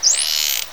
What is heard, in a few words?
dolphin; scuba